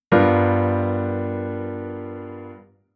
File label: Chord Tension 11